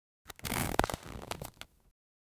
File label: zip and unzip sound